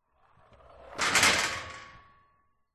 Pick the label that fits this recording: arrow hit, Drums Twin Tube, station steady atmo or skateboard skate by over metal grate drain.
skateboard skate by over metal grate drain